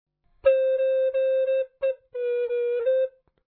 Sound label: flute